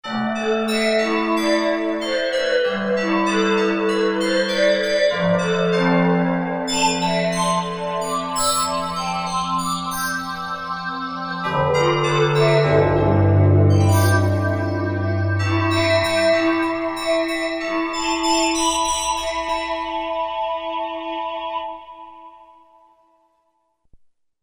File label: Bali Bell, Korg MSC-10S Ethnic